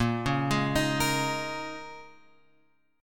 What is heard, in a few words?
A sharp Minor 11th A sharp, Guitar chord; A#m11 Chord {6 4 6 X 4 6} arpeggiated